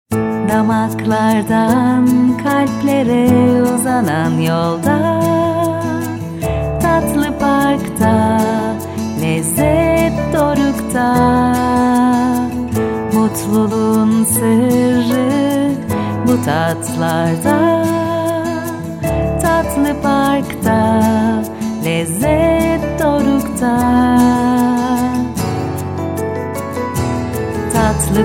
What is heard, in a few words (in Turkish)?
vokal jingle; tatliparkdemo